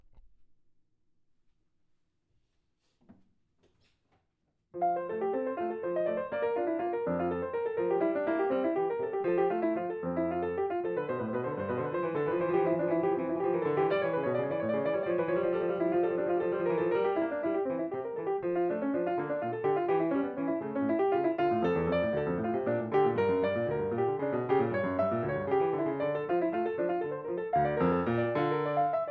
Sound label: Bach Prelude in F major, piano